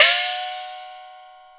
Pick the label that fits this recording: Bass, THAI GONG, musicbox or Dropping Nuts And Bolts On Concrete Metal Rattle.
THAI GONG